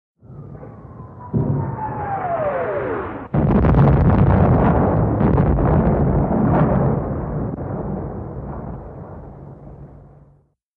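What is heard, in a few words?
Incoming Shell Whir Impact, modern artillery exterior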